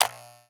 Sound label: Electronic, Latch, click, heavy, clippers, on; Eiravaein Works; Vaeyan IV